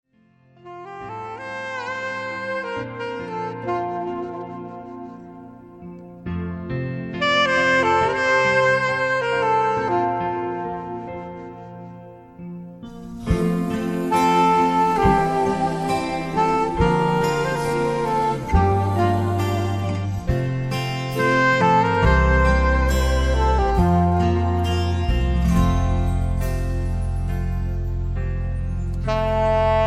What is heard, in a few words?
sax, gospel